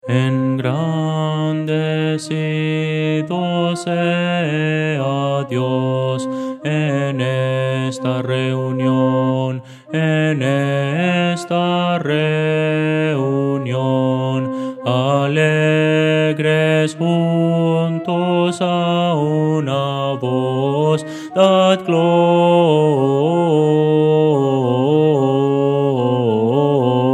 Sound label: contralto; Voces para coro